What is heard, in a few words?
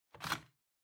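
Ceiling Light Remote Control Holder Take Out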